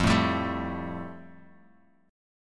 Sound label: F Major Add 9th, Guitar Open G